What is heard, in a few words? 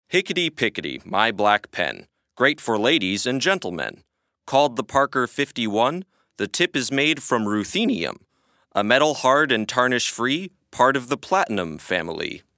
Ruthenium Element Jingles